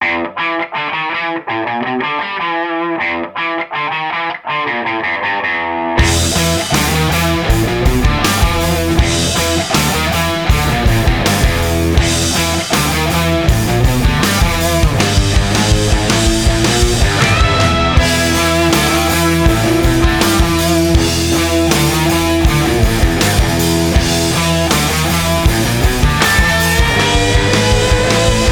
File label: Kicker Rock